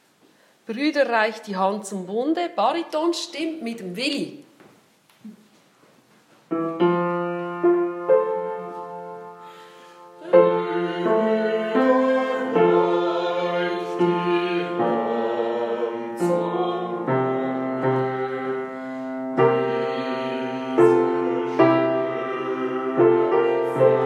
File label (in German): Bariton